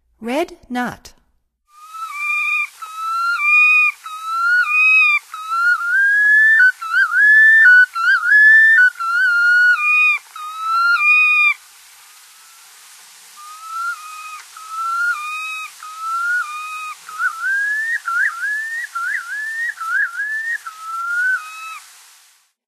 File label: Red Knot; North America; Stokes